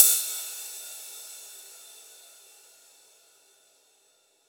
Rides, Cymbals

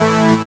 DR. LOOP; X-Static Goldmine 3; CHORDS

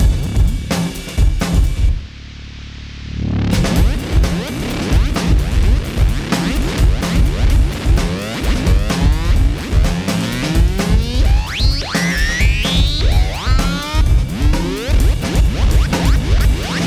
Beat Loops; Zero-G; Monster Beats

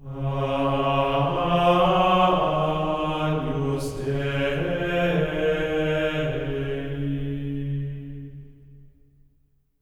AGNUS C#4, voices; Spectrasonics; GR AGNUS

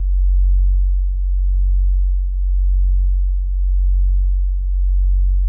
F#1, synth basses; Music Store Sound Service; Einstein

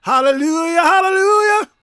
gospel male, vocal; ILIO; Vocal Planet

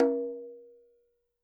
CONGA; Roland MV8800; KILLER CONGA

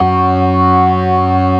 STOPS, ORGAN; Keith Emerson Signature Series

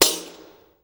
TAMBOURI.HIT; Best Service; World Colours

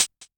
hi hat closed, Korg Electribe ER-1

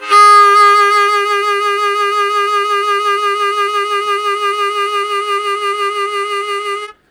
Strong Vib Sustain Ab1, Harmonica; Zero G

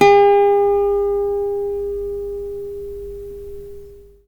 LUAN G4; Spectrasonics; Heart of Asia

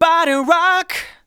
vocals sung F#; Techno Trance Essentials

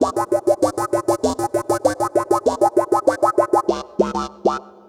Synth Arpeggio; Sonic Foundry (Sony Creative Software); Stylus Pressure Urban Grooves on Digital Wax; Tech Mecca

